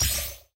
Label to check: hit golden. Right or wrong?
Right